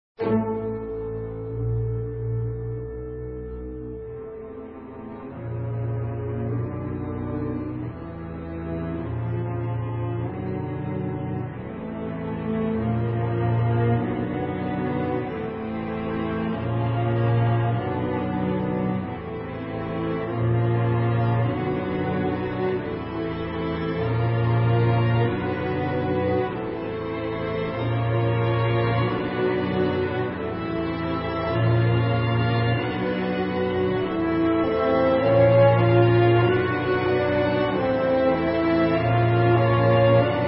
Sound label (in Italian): Solo e Notturno